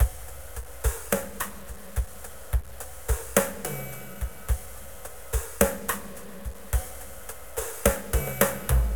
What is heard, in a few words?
Acoustic Flow, Processed Percussion